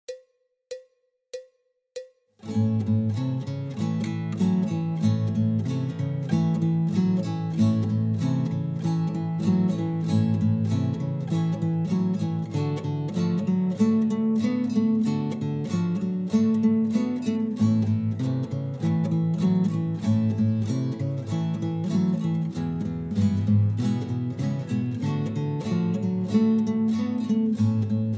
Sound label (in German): Rock in A, gitarre